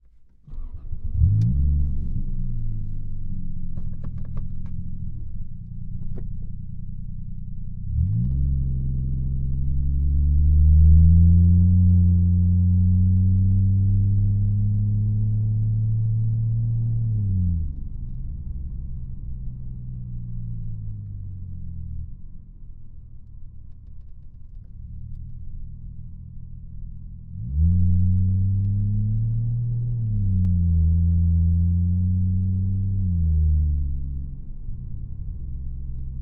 Toyota Chaser Mark 2 INTERIOR start idle medium drive away and medium approach; FLYSOUND